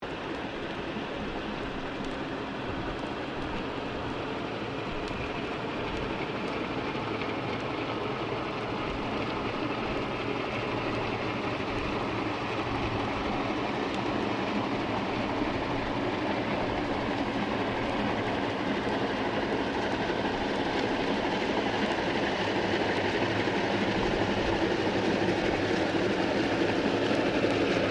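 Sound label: Boat Custom Restaurant Boat Large Engine Manoeuvre Submerged; large resteraunt boat; Boats; Vehicles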